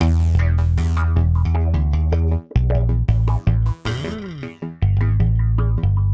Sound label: Bass 78